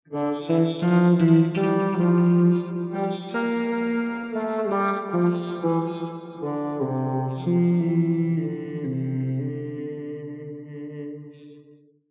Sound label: Monk Vocoder; r3dias